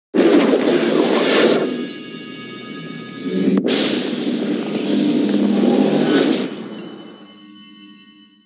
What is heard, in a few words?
dark magic